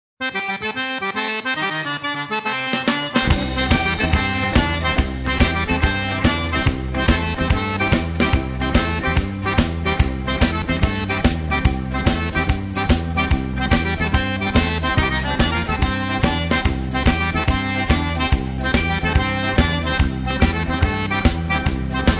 Blues

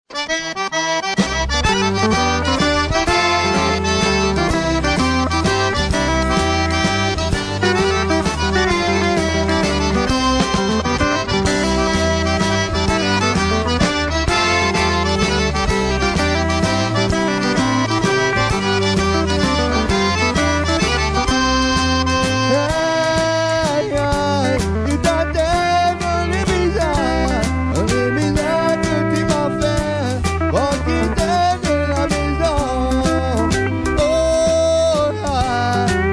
Waltz

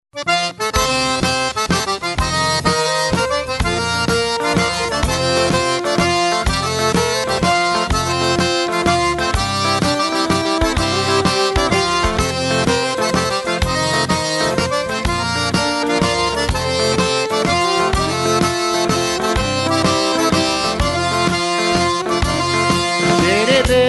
Waltz

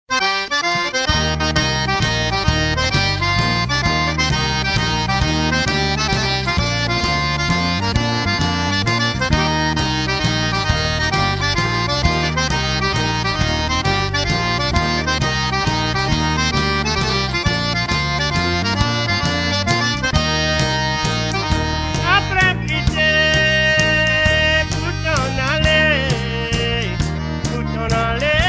Waltz

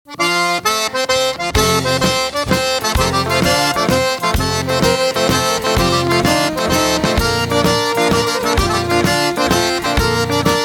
waltz

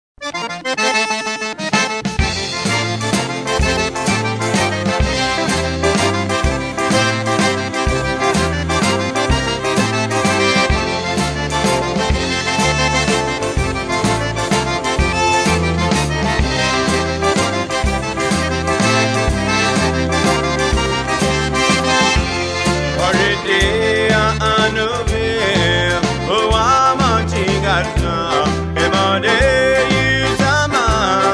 waltz

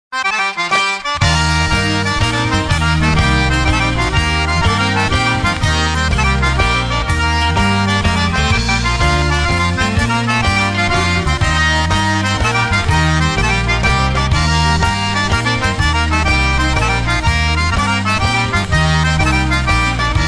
WALTZ

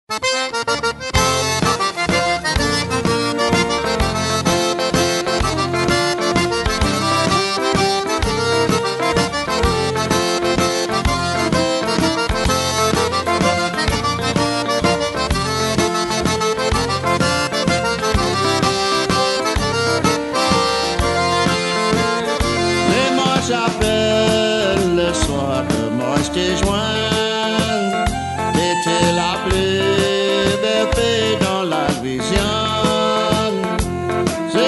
waltz

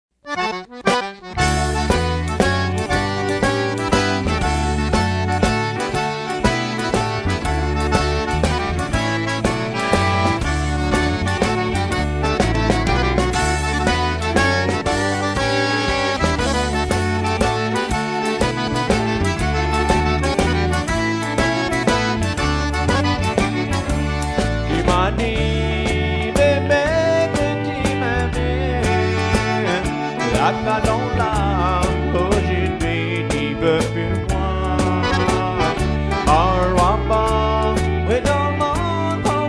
Waltz Two Step

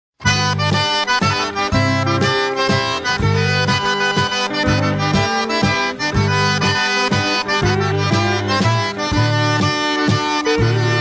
Waltz

